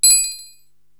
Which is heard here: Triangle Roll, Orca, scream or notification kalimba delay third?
Triangle Roll